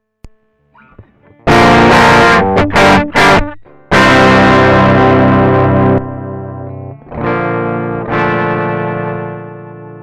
mctube channel overdrive to Channel clean, Eq on-off, EQ pots Ajusts, ON-OFF